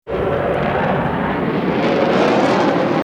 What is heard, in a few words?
Bullet Time; FXHome; Bullet and Gun